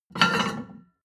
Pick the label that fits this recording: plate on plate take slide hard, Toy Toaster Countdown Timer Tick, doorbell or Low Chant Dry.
plate on plate take slide hard